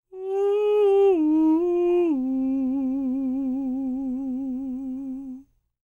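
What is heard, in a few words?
falsetto, Vocal Planet Jazz FX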